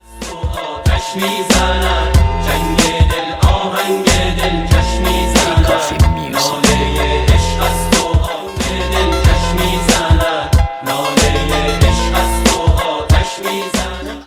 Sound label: new music tanze khandedar